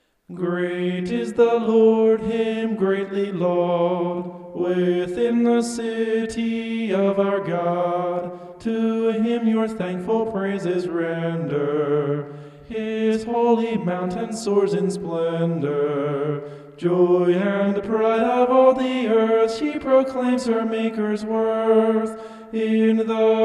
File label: vocal homoph; psalm 48